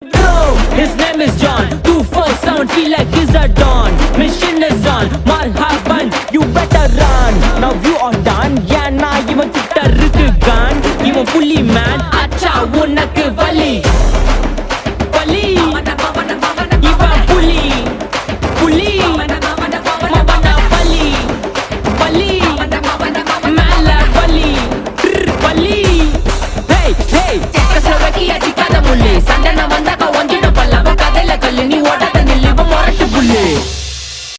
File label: Song Ringtone; Dhruva Natchathiram